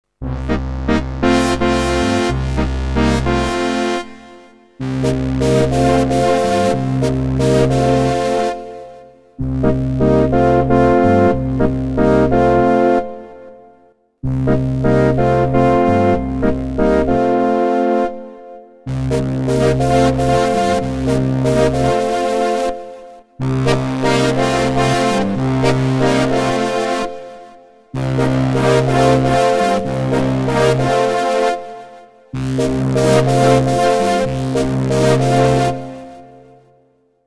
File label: Montage CS80 FM; Part 1 Alg 31 bis 24